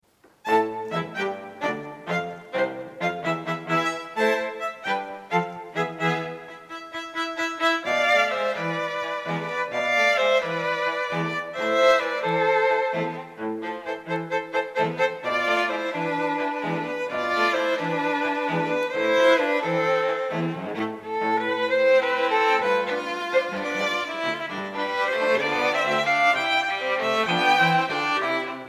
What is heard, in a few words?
quartet